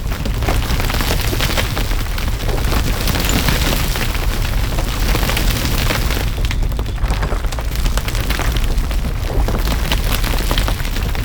Boulder Roll Loop, traps; Objects; Champion Crypt

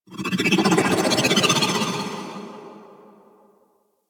Mountain Pass; Oro Sculpture Contract; Warframe; Ambience; The War Within

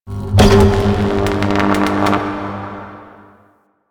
Electricity Spark; Ambience

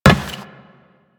Run Metal, Footsteps; Warframe; Teshin

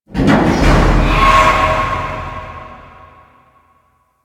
Cargo Elevator Distant Metal Groan; Warframe; Ambience; Guild

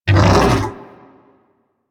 Vocal Death, critter; Warframe; Hunting Critters; Undazoa

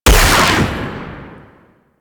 Rifle Secondary Shot; Warframe; Weapons; Wisp Rifle

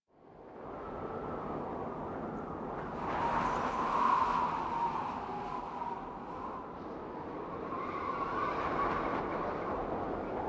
Courtyard Intro Ambience; Cinematics; Warframe; Duviri Quest

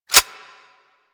Harpoon Gun Reload; Warframe; Sharkwing; Grineer

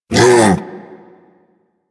Predasite Vocal Tranquilized Grunt; Warframe; Hunting Critters

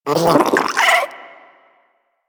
vocal idle, critters; Warframe; Hunting Critters; Nexifera

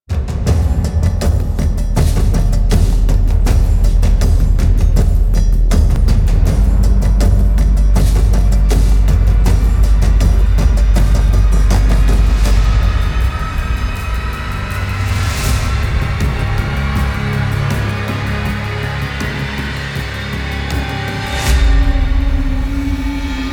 Cinematic Cue, Music; Warframe; DE; Mech Event

